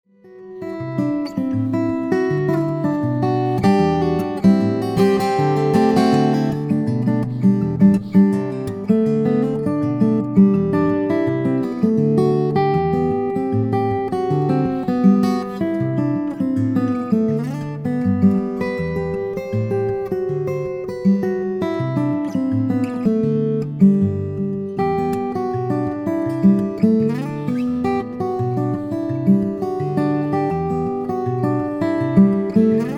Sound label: Acoustic Music